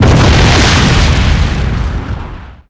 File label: Large Exp, Explosions